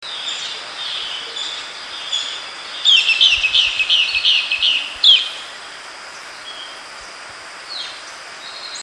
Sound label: social flycatcher, Belize birds birdsongs